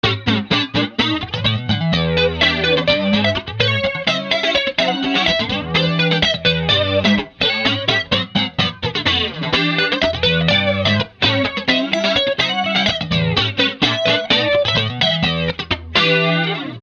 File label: Boss BF-2 Phunky Flanger